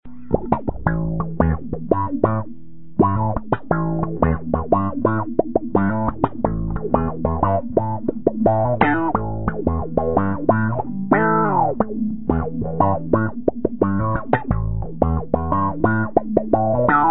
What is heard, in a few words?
q tron